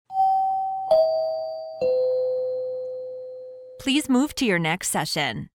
three chimes descending please move to your next session